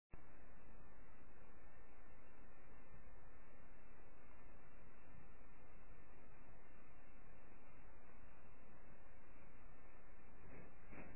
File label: haha, female